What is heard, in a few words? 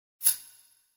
UI back sound